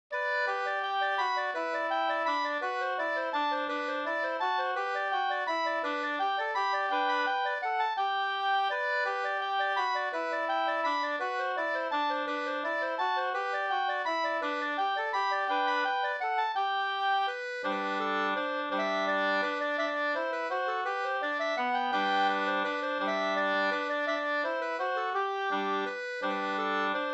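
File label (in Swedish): vals, two-part; Alfagelvalsen av Albert Nordstrom Go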